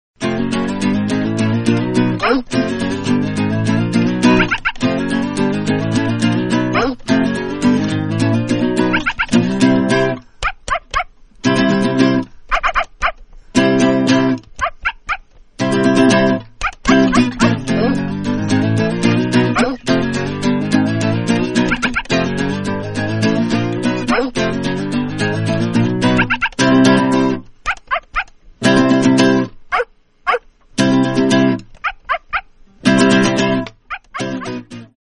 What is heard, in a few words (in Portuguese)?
musica violao e animais